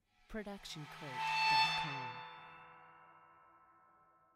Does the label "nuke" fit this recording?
No